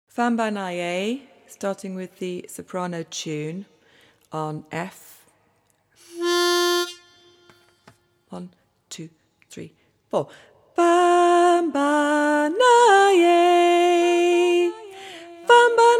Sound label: alto melody